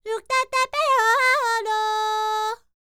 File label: Vocal Planet World Voices, NOMADIC